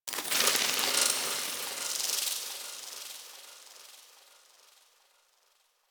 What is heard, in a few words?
Ship Metal Movement Texture Small; Ambience; Warframe; Corpus Ship Remaster